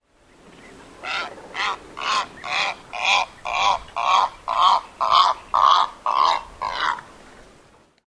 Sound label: purple heron